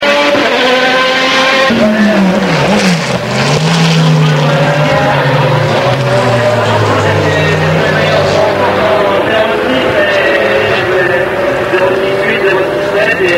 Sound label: bmw audi nissan porsche 911 og viper